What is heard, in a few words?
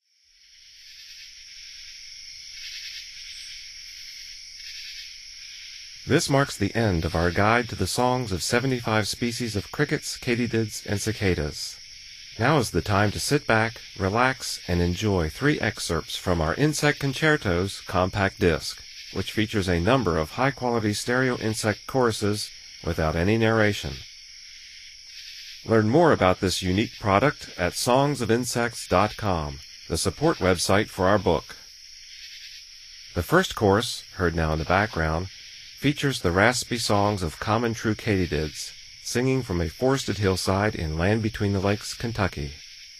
Common True Katydid chorus; N. America